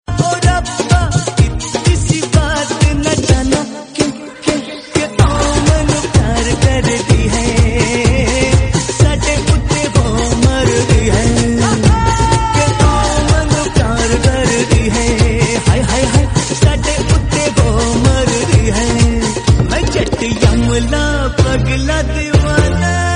pleasing hindi song tone ringtone; bollywood

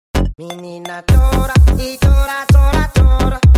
club music ringtone

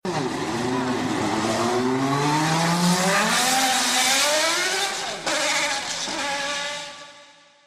ferrari 333sp sound

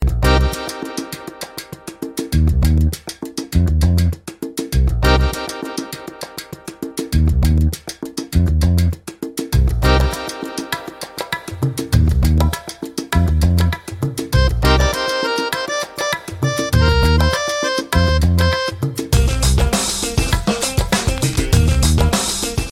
disco ringtone

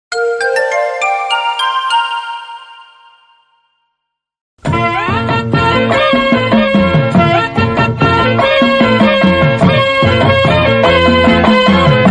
comedy bgm ringtone, funny; karakattakaran

